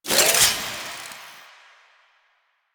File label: Finisher Parazon Equip; Warframe; Kuva Lich; Finishers